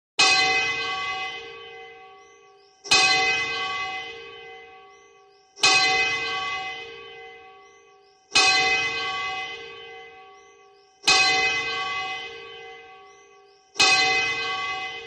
Dorfkirche, Tessin

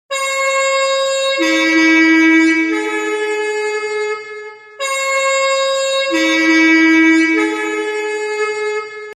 CH Postautohorn

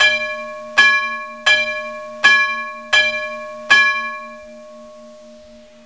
Stationsglocke

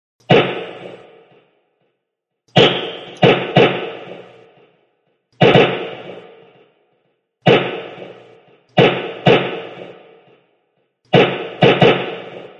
Gewehrschüsse

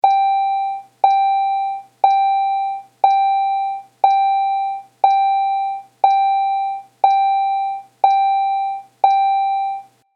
Bahnüberg dong dong

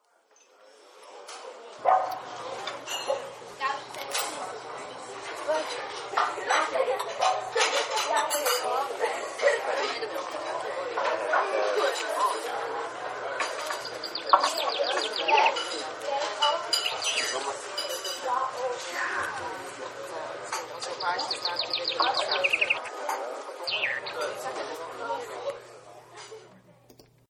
Gartenrestaurant

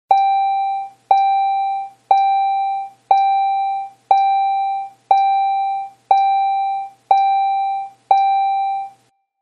CH Bahnüberg.

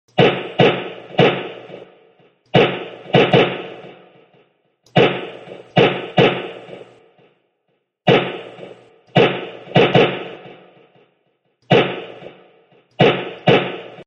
Gewehrschüsse

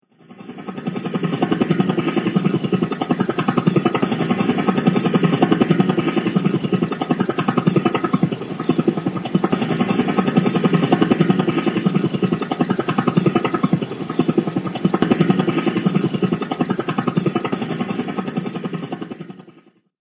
Helikopter

